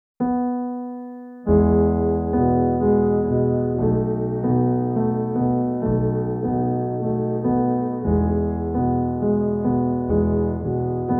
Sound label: Chopin leftHand WienerFilter; Op010-03